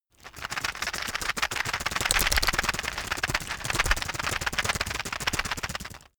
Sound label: plastic drug blister shake hard